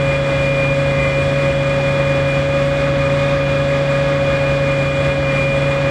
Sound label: chieftain engine int rpm 99, tanks; engines; War Thunder